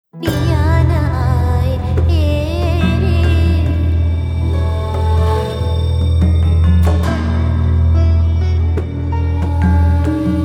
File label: Symphonic; ethno